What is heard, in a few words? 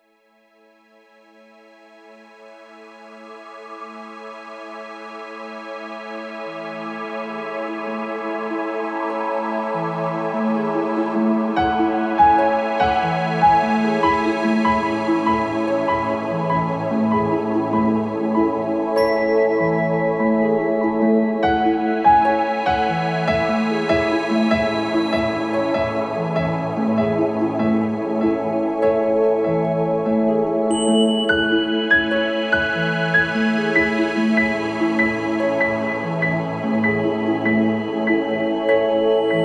Instrumental